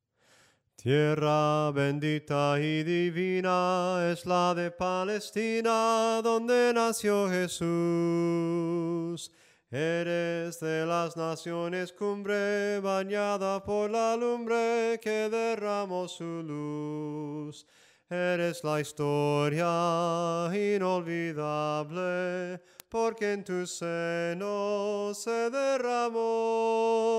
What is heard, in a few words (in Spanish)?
SOPRANO CANTADO, himnos en melodia soprano